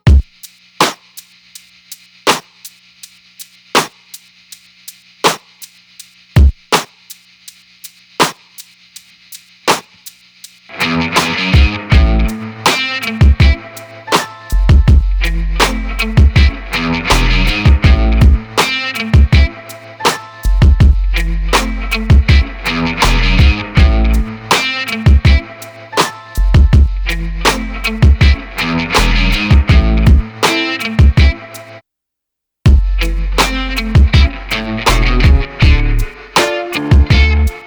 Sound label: instrumental